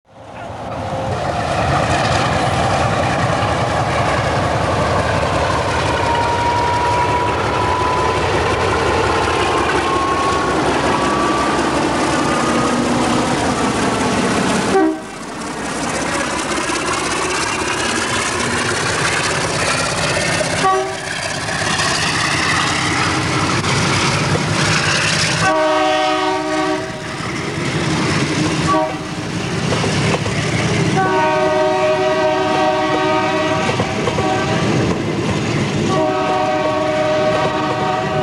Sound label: MXS624S 060-DP-151 leaves Beja on the standard gauge line; ALCO power in Tunisia; SNCFT MXS624 DP-151